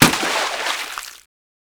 Water Balloon Impact

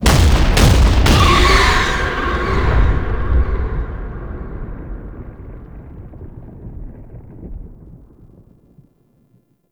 Cannon Close; Ambience; Gameplay; Makvoss; Warframe; Tau

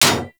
Bullet Impact Generic Enemy Shield; Warframe; Weapons; Bullet Impacts; Surface

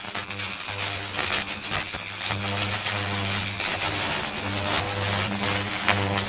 Death Electrical; Warframe; Grineer; Electric Skeletal Death

